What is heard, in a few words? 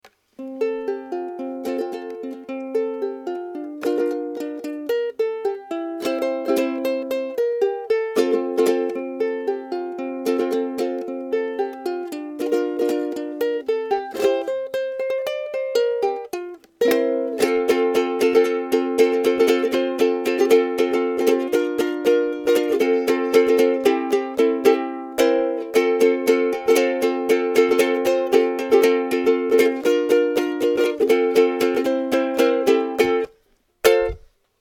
jingle bells, ukulele